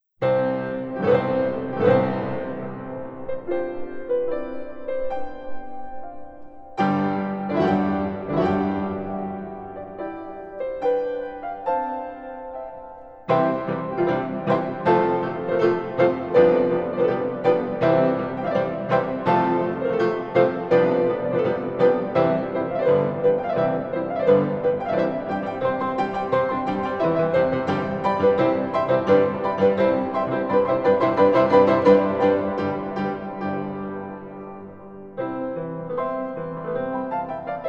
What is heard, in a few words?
Allegro Vivace Symphony